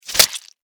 Extermination, Fly, Swatter, Hit, Squish, Insect, Bug; Blastwave; Animals:Insects